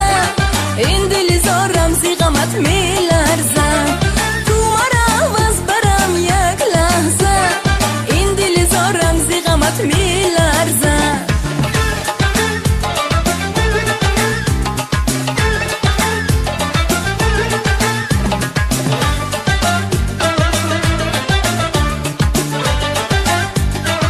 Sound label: tajiki music ringtone